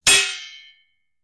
Sword fight metal shield or chain armour hit

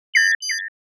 Futuristic error

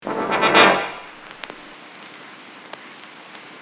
Low brass horror reps on vinyl

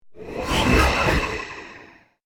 Horror accent eerie hiss

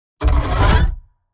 Double bass and wires designed hit

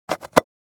Small plastic box open

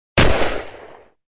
9mm handgun single shot

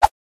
Very fast swish

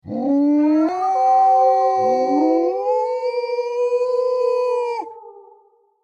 Wild pack of wolves howling; wolf

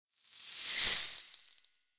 Cinematic transition freeze whoosh

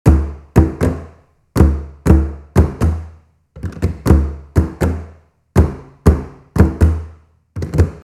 Bodhran simple rythm; gfxsounds

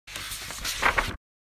Magazine page single flip

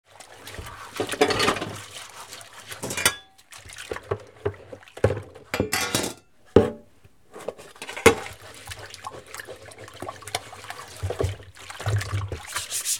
Dish washing kitchen water dishes clanking

